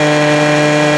scooter engine; vehicles; Tony Hawk's Project 8; XBOX

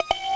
Button Press Fail; interface

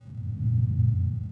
underground; ambience; Soldier Of Fortune II Double Helix; XBOX; kamchatka

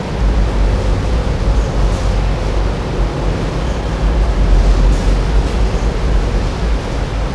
dock, ambience; cairn

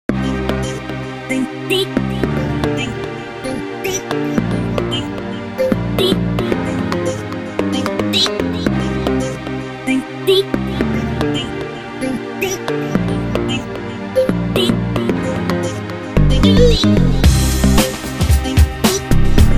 Pinball, Music; Pure Pinball

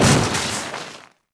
WRECK, Vehicle

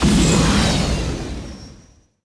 fighter droid takeoff

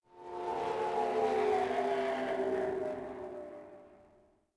Passby, vehicles; Coruscant

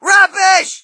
crowd, shout; Mike Tyson Heavyweight Boxing

